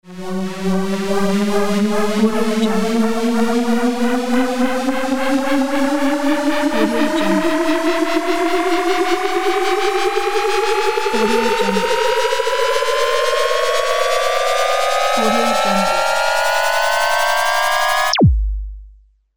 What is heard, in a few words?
uplifter